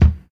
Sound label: fluffy kickdrum sound d sharp key, kick drum sound tuned to the D# note; kick drum samples